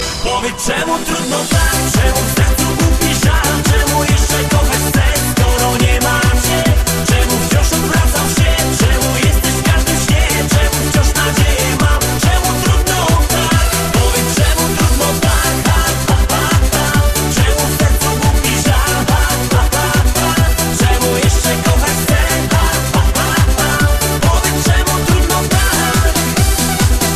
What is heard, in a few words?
Dance